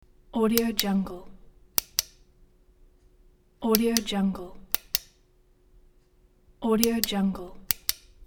light switch turning on and off

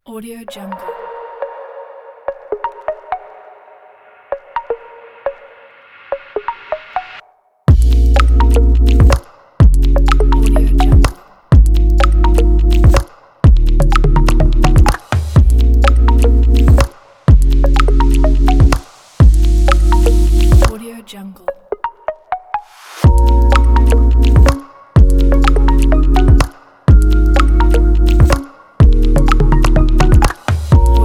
stylish abstract

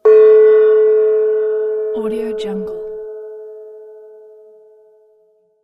small gong

